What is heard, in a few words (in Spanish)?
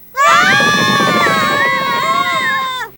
grito